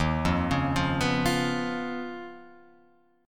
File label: D sharp 7th Suspended 2nd Sharp 5th Guitar Open F; arpeggiated; JGuitar